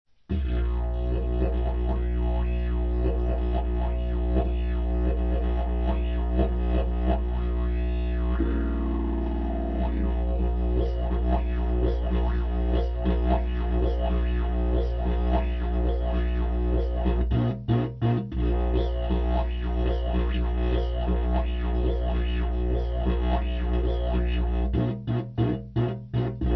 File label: Didgerikloo